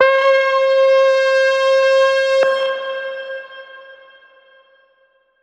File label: Keys C, Trap One Shot; Ghosthack; Eerie 150 A#m